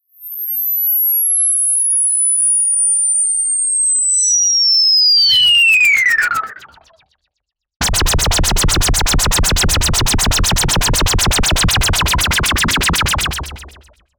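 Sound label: weapon usp silencer, handguns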